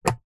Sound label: household blender lid close